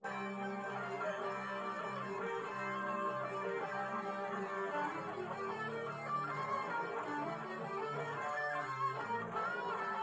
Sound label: nodrums; SiSEC10; underdetermined